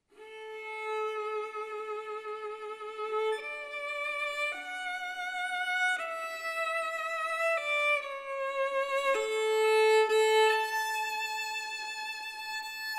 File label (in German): Violine Hopf